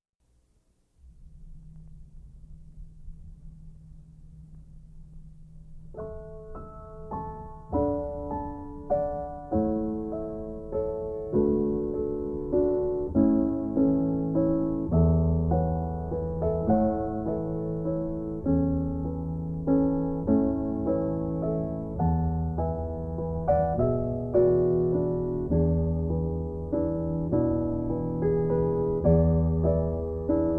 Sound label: piano instrumental